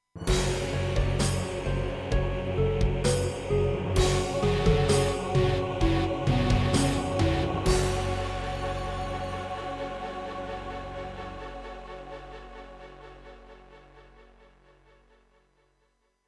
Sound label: Introduction, Serdaco X3MB Buran; Doom (Sigil)